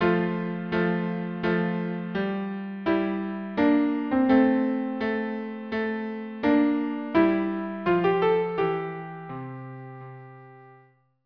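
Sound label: Largo violin cello; Xerxes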